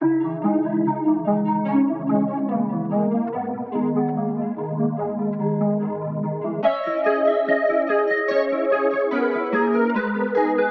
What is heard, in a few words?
145 BPM G# Min Guitar; Tantra